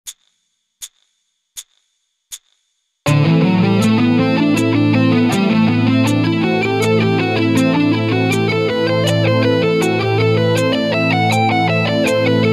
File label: economy picking